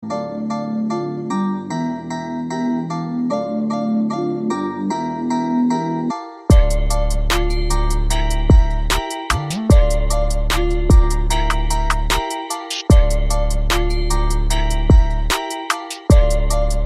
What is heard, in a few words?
Trap beat freestyle